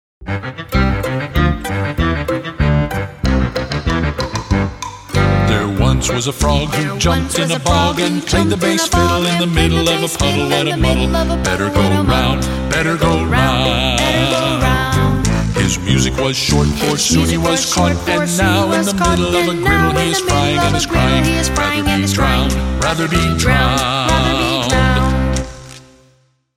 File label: Frog Music round; A Beka; Songs we enjoy 3